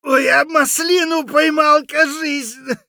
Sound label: hurt light; Escape from Tarkov